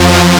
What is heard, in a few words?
synth